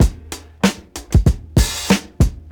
95 bpm 2000s breakbeat B key, Free breakbeat - kick tuned to the B note. Loudest frequency: 1204Hz; drum loops